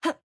Attack Junk; Jackie

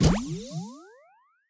Trials Carousel Page; subway surfers city sound effects; Android, iOS

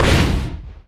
Enemy Ghost Hide Smoke; Enemy Silkhat Smoke; disney star smash android ios mobile gamerip 2020

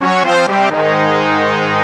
Defeat; maze of adventures windows gamerip 2018

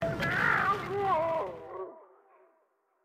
Mech Death; StyxMech

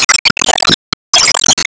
Enemy Underground Active Hide; disney star smash android ios mobile gamerip 2020

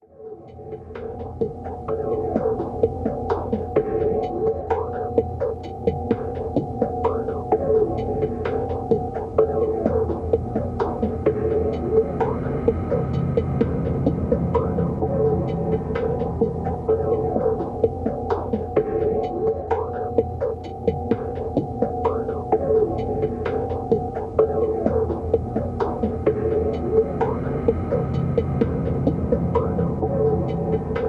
BGM HurryUp lowcut mild; real dasshutsu game × nintendo 3ds chou hakai keikaku kara no dasshutsu

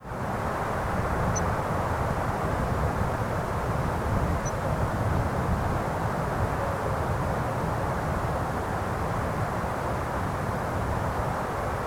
Hoover dam Open space mountain peak; Hooverdam2 Openspacemountainpeak

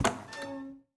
Window Open

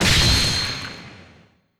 Last Wave Target Defeat

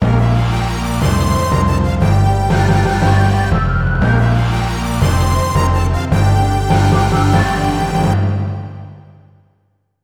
Win; Senkai Taisen TV Animation Houshin Engi Yori; PS1

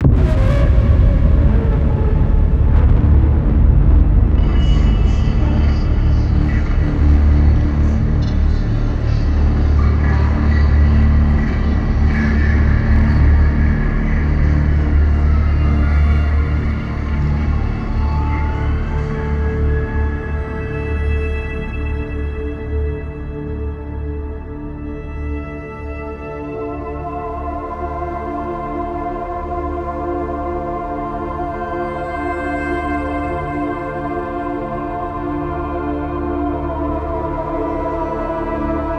The Void (City Ambience); Dystopika; Meditations From The Future

